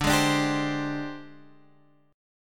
G sharp Major 7th Flat 5th

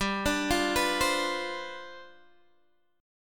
G 11th, Guitar, Open G

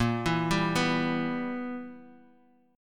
B Major 7th Suspended 4th Sharp 5th A sharp

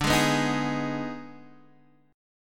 Eb Major 7th Suspended 4th Sharp 5th

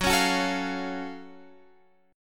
F sharp Minor Major 7th, guitar; chordshape; down

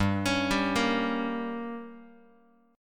G Diminished, Guitar; arpeggiated; JGuitar

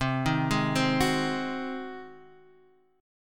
Ab 7th C

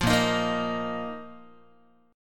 Bb Suspended 4th Bb down Guitar; Bbsus4 strummed; 8,6,6,8,7,x

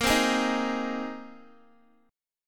A sharp 7th Suspended 2nd Suspended 4th, Guitar; A#7sus2sus4; strummed

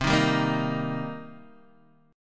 C Minor 7th C Guitar Open D

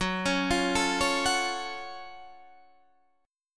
Gb Minor Major 7th Gb down Guitar Half Step Down

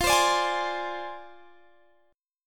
F Minor 6th F down Guitar Standard; Fm6; strummed; JGuitar

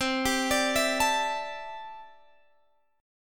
C 6th Add 9th, C, down, Guitar, Open G

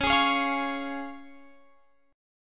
C sharp Major, Guitar Open F; strummed; JGuitar

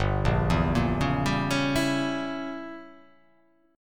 G Diminished 7th; 3,1,2,3,1,2,3,1; down

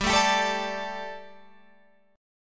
G Suspended 2nd G, down, Guitar, Open F; Gsus2; strummed

